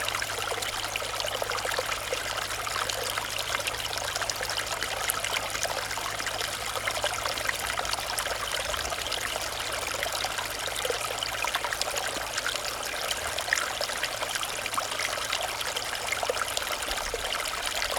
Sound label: Stream, Katschberg